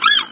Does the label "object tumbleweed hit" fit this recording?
No